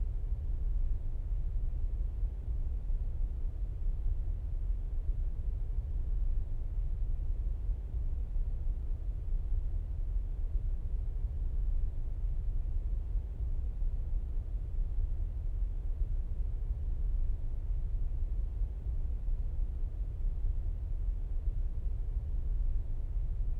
ambience movement whoosh low

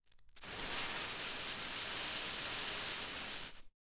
ambience room forge working layer

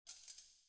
tools

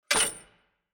morgenstern put; player

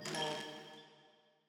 ambience room dark temple ritual ready

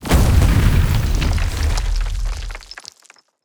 Fire Elemental Fire Flash Explosion; TANK; 3Souls

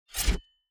crusader strike sword

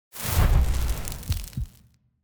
Fire Sphere Boost; TANK; 3Souls

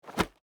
mob grab

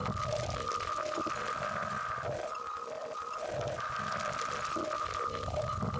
Drone Gravitrone Fly; TANK; 3Souls

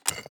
submenu barrier open

